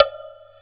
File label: WOOD BLOC; Real Oldies2